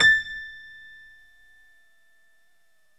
DBL GRAND; OMI - Universe of Sounds

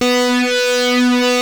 keytar; jazz jackrabbit 2

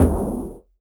Elektron Monomachine, Drum Machines

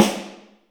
SNARE, WET SNARE

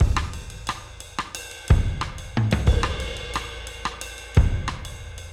Drums 90, Loops n Lines, 90bpm

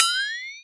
Flexitone Up; Multisamples; Planet Earth; Digital Sound Factory Planet Earth

